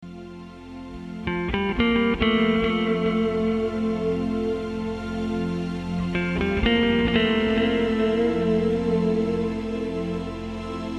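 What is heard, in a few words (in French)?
Style pop; guitare; Mystère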